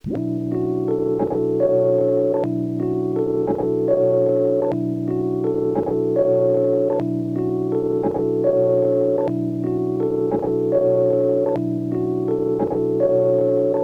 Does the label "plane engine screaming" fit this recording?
No